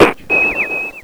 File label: GUNFIRE